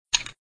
stone undo; zootrivia

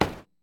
object hit cone; lightning speed

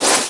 throw; Angry Zombies

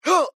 jump; star wars rebels team tactis; ezra

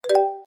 watch; Dino-Jump

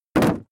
crate land; star-wars-rebels-team-tactis

